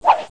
Roll Click

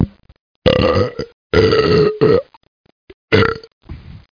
belch; Monster Media Number 15

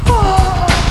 minimize; 5th element

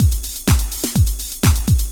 drum loop house 125; eJay

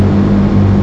rev only

